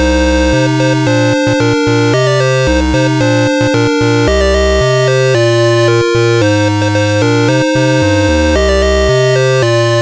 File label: Dragonworld sid CSG8580R5